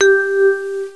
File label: note G3